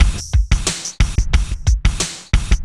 Club Tip, R&B Drums

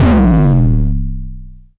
Kicks, Elektron MachineDrum SPS1 MKII

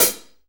Room HH short, Room Drums; Akai MPC500

